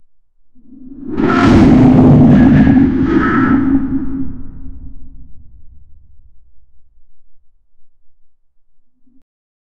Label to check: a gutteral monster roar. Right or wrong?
Right